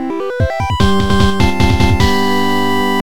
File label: Victory!; Cave Story